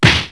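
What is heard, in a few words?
hard strike; Wired for Sound Pro CD; Aristosoft, Inc.; impacts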